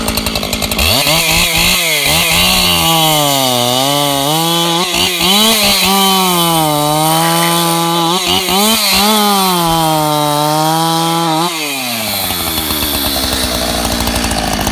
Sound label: Chainsaw